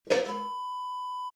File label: metal bucket pick up